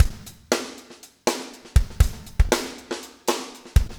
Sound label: breakout 120, 120bpm loops